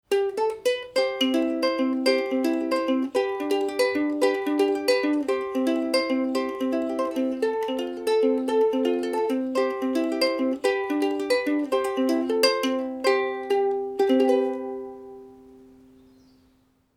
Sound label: Harley-Benton UK-11-NT-RW Picking